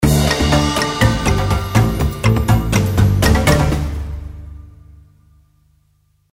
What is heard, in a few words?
win big; Stampede Call of the Pride; Eyecon